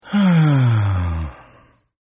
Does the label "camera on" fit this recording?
No